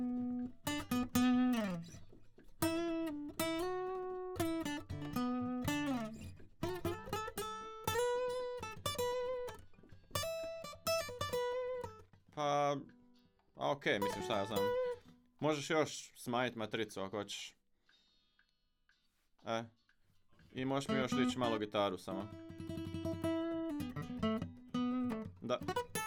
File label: Solo; Reaper project